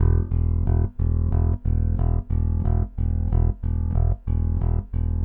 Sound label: Rock, Bass; Bass X